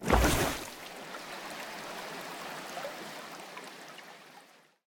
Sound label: exit, underwater